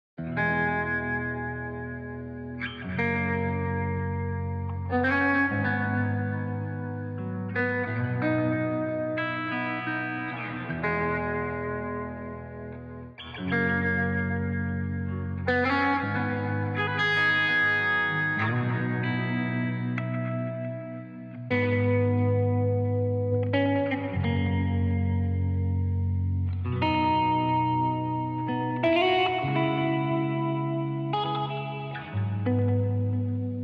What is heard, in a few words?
Guitar Reflective Electric 90